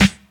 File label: 90s thick hip hop snare sound g key, snare drum tuned to the G note; snare drum samples